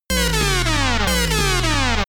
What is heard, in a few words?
spaceship alarm